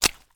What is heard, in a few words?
pizza walk; pizzaria